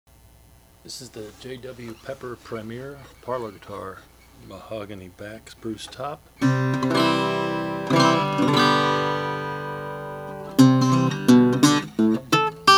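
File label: premier natural, Guitars; 1910; jw pepper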